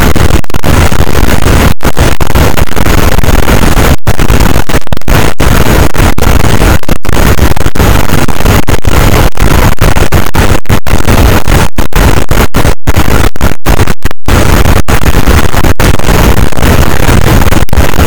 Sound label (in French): cachalot negatif, boat, Physeter Macrocephalus